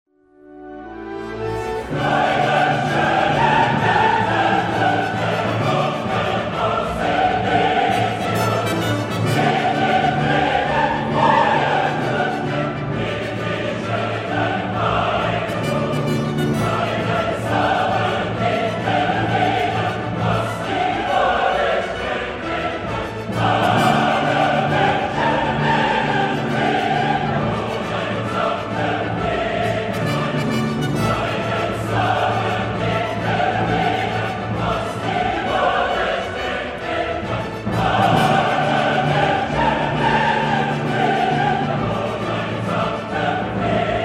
choral; beet sym9

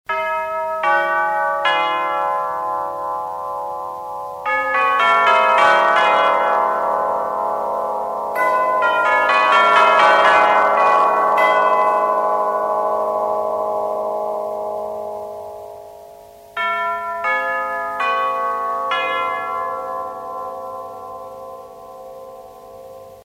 tubular bells; percussion instruments

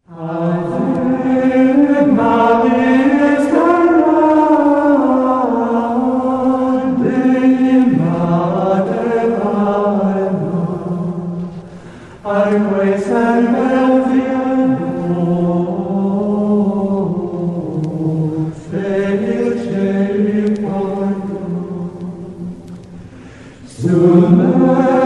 gregorian chant

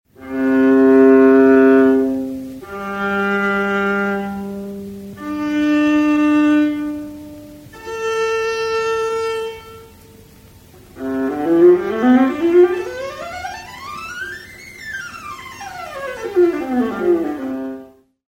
viola

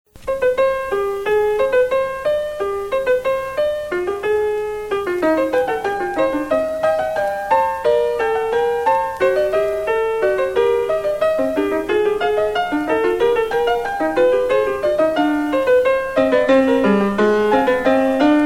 fugue; Baroque; Fugue 2

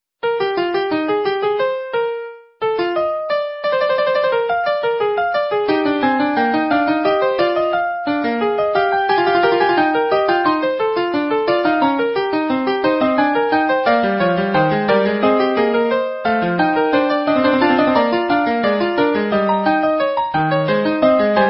fugue BWV 852

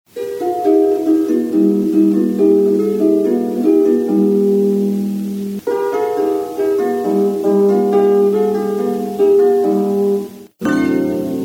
harp

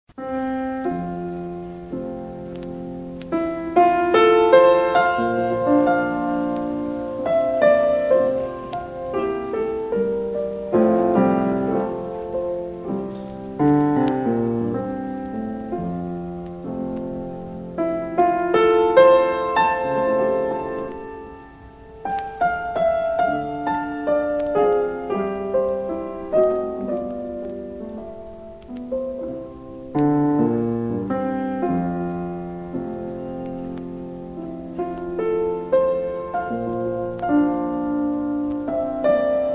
piano

